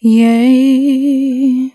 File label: "yay", sung; dragoness